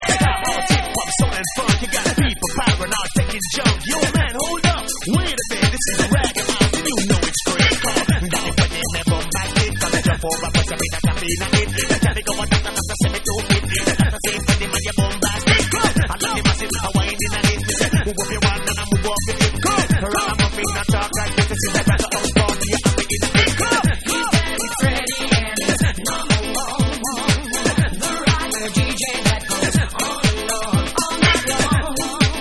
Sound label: RAGGA HOUSE Ragga House Mix